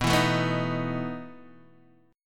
E Major 7th Suspended 4th, B

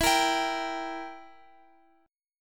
E Diminished down Guitar Open F; strummed

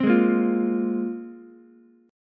A sharp 7th Suspended 2nd, Ukulele chord; strummed; JGuitar

